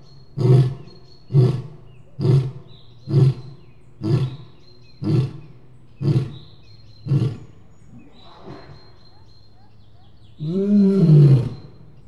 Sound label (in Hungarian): oroszlán bömbölés; szegedi zoo 2011